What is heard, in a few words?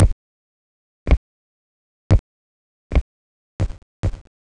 Footsteps Porch